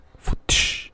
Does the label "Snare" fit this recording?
No